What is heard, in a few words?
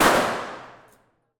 pistol gun fire; nova sound